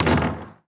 Street Ball Glass Back

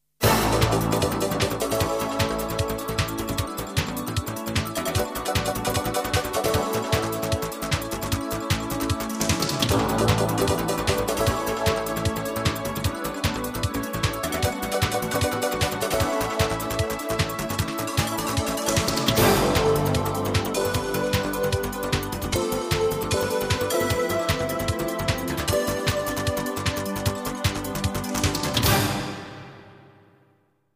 jingles; 90 DDD Jingles

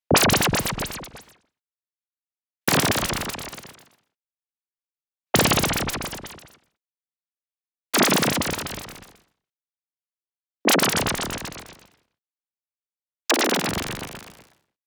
LASER Shot Fast Tremor; Anime Essentials

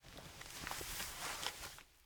headphone Put On; Apotheorasis

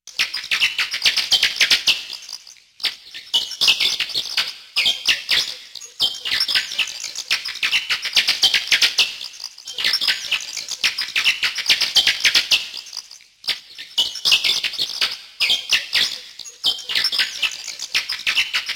Giant Bats; Halloween Havoc - Sound Effects; Ktel

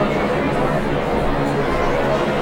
Restaurant Indoor; Backgrounds; 1001 Sound Effects

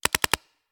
Paintball Gun; Sound Ideas; Guns

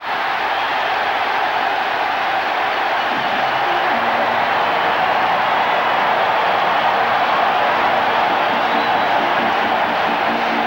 Baseball Crowd Cheer Organ; Crowds

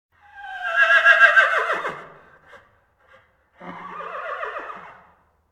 Animal effects snorts grunts farm ambience

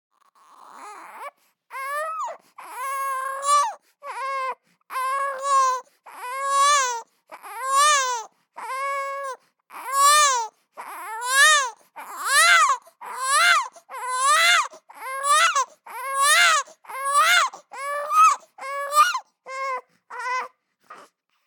BABIES WEEP Boy 3 months Heavy Increase; Baby Boom

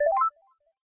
user added, skype

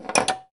Pinball Ball Hit Rail

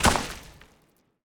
Earth Enemy Footstep; AudioWizards

